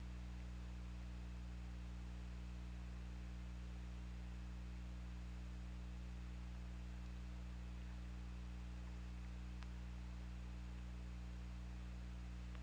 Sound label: Orca; OrcaLab